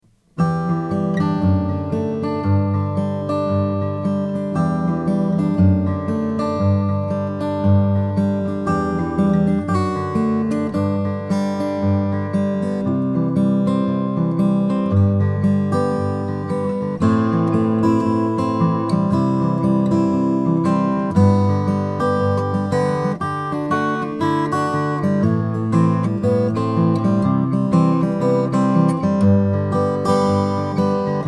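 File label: greven african blackwood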